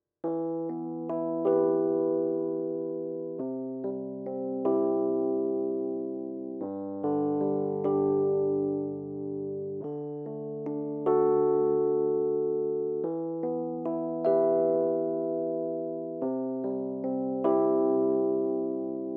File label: rhodes